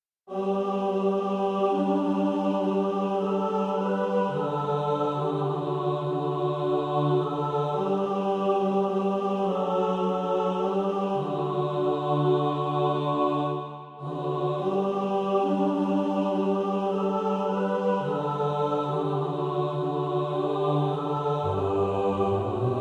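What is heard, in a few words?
bass; Oh Wonderful Word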